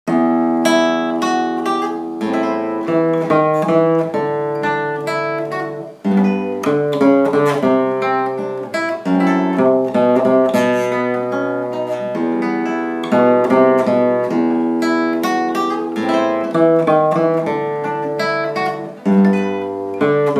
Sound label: Guitar solo; Guitar Club Settembre 2015